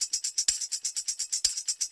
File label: mix percussion loops, 125; Vocal House; PS-28 Vocal House Samples